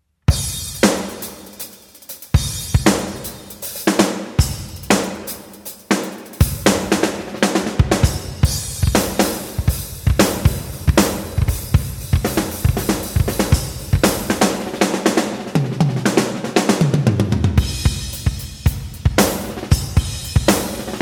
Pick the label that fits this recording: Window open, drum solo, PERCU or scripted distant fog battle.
drum solo